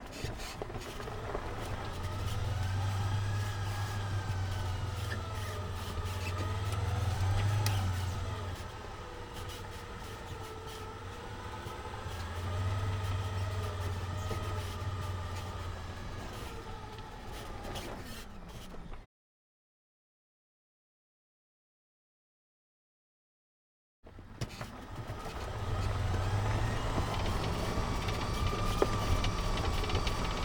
Polaris, Ranger, Onboard, Slow, Drive, Various, Short, Stop, Left, Electric UTV; Polaris Ranger Electric UTV 2017